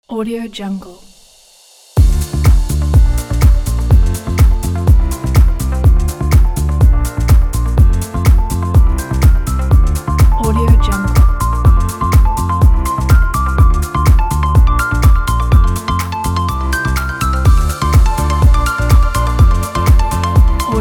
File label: summer chill house